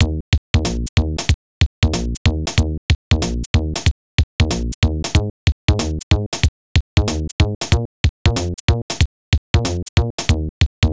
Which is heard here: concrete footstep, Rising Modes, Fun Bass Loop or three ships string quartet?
Fun Bass Loop